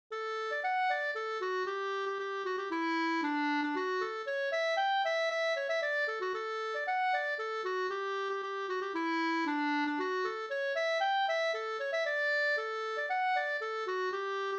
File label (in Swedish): slangpolska